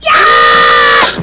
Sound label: yell